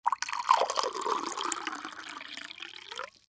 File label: bottle fill glass